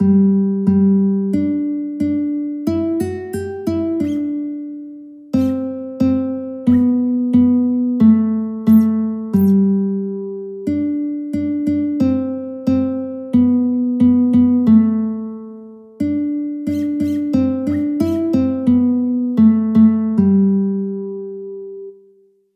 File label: baa baa black sheep guitar audio